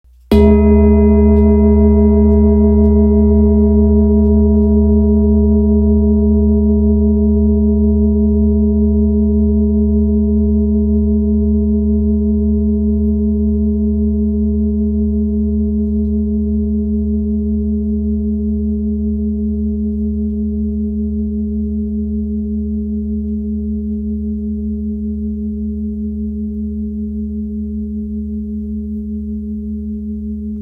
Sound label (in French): bol therapeute re 2.02kg